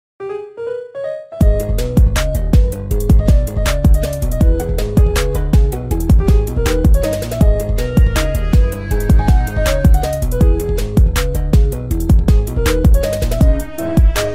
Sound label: trap remix